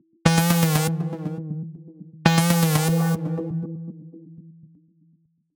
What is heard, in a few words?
Tech Synth 120 E, techno 120bpm; musicradar; Synths